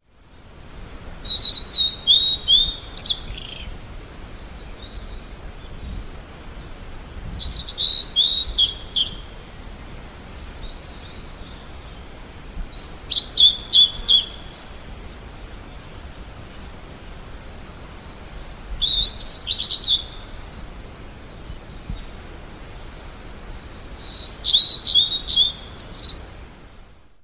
ooruri, singing

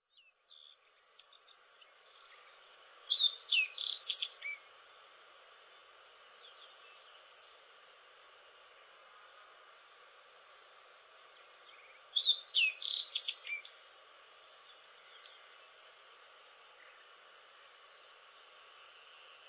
aoji, singing

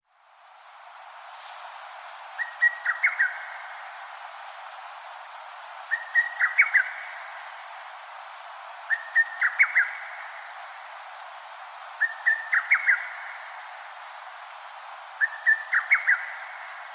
hototogisu, singing

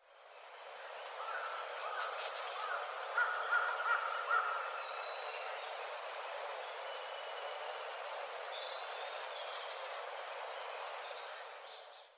sendai, singing

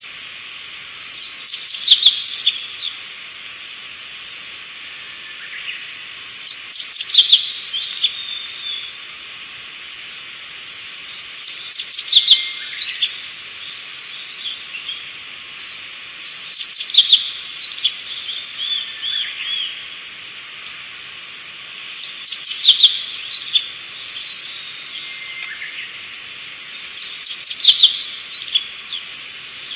hoojiro, singing

